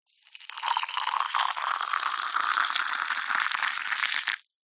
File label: coffee pour into cup